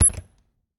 Refridgerator, old fashioned, wooden, steel, brass clasps, hinges, close, squeek, piercing; Eiravaein Works; Vaeyan IV